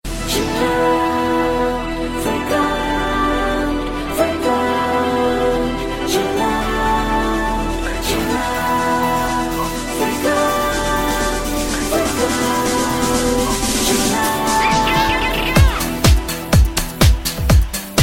Chillout

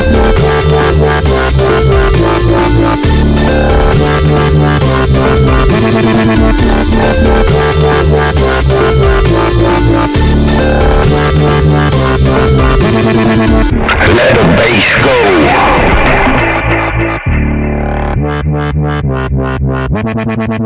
Let the Bass Go

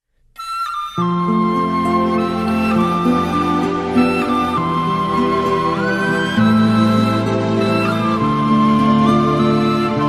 Small Flute

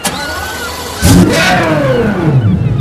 Car Engine Ignition

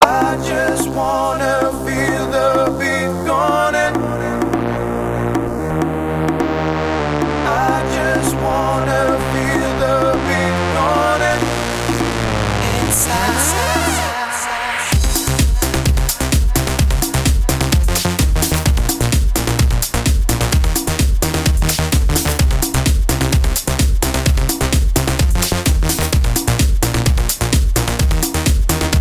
Beat

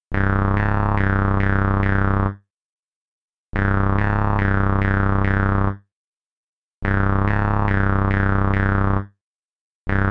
Annoyed

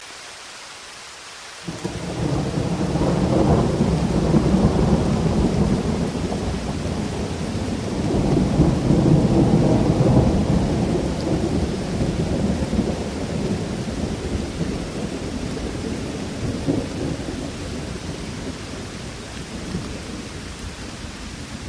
Thunder

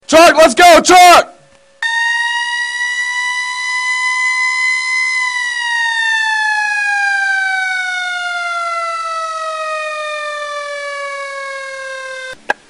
Truck

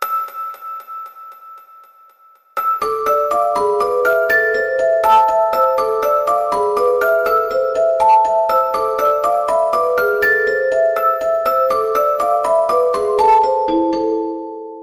Loud Rings

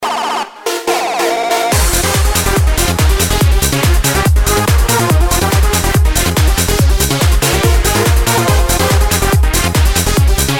Psytrance

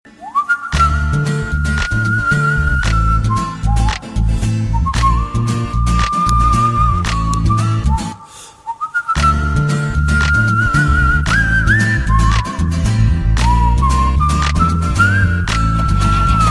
Whistle; Bheema

